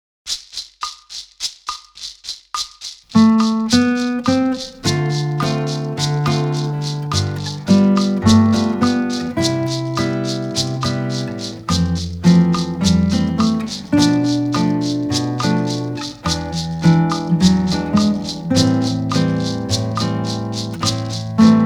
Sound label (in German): Begleitung